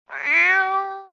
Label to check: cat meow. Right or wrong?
Right